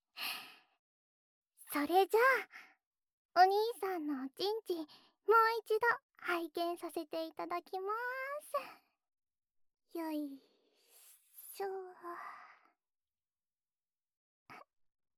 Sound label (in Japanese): 耳舐め亀頭責め, ASMR; おち○ちんが馬鹿になっちゃうくらい擦ってあげますねー♪